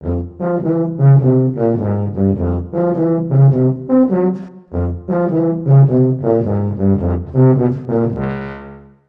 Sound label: Orch Chase Drama Pickup B3